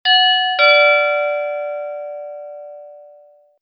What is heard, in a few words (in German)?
Gong